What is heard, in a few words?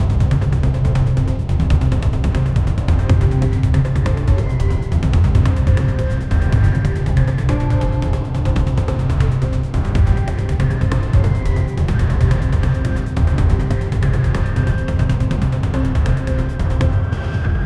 a cinematic action backgr